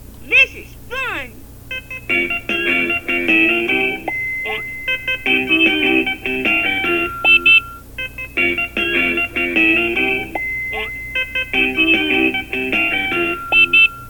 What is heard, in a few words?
scooter